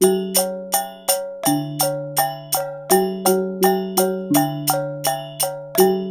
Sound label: WYANG L, Heart of Asia